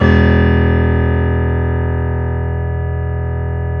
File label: CONCERT PF, B0F; AKAI S-Series CD-ROM Sound Library VOL-7